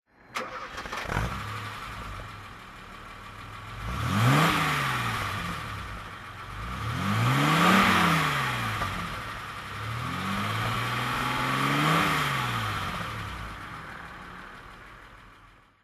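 mercedes benz 220se w111 convertible throttle, engine sound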